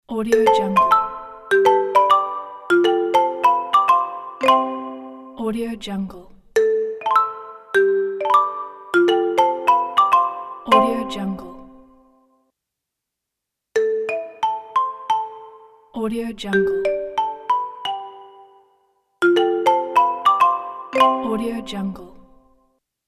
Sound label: cartoon logo